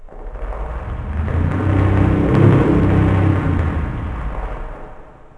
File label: ambi; Shadow Man